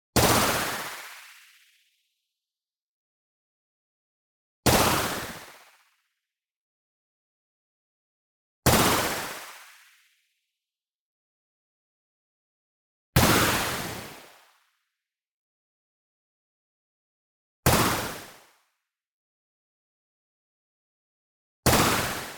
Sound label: IMPACT WHITE NOISE Ascending Flutter; boom library; Anime Essentials